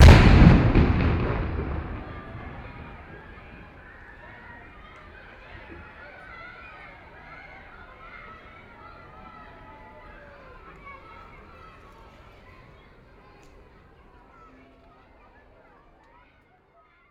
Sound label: Canon